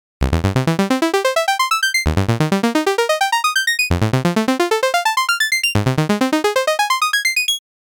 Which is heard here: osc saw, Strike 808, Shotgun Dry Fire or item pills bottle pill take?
osc saw